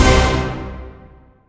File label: Orch Hit; pitchglitch